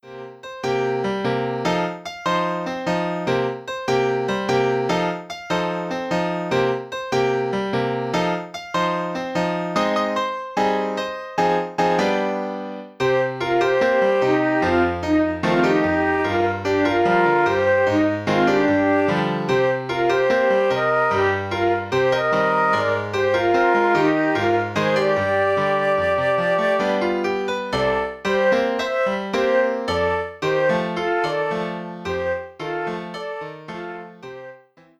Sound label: Lets Dance Two Recorders and Piano